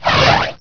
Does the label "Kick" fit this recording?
No